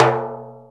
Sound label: Timbale Rim; Planet Earth; Digital Sound Factory Planet Earth